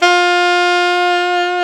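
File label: SAX ALTO mezzo forte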